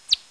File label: natterer, bats